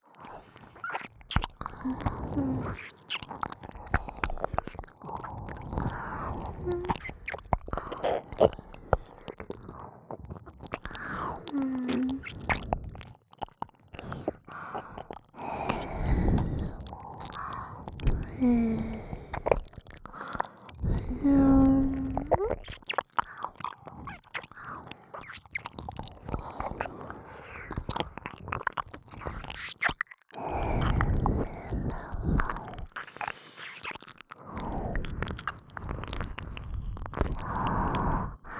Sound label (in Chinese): 黏糊糊雙重舔耳; 由紀＆華戀; 附錄迴圈式音軌（自慰輔助）; 耳邊迴圈